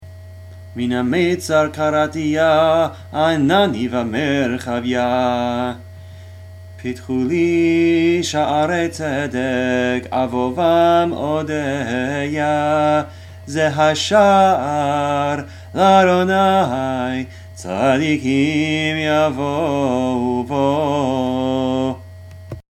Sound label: Hallel (chanting); Min Hameitzar to Pitchu Li